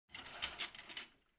Household Drawing Curtains Wooden Rails Sliding Closed; Slowly; Window